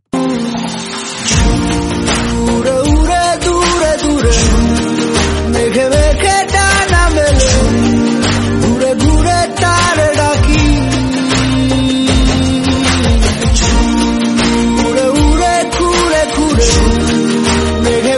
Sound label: BENGALI SONG RINGTONE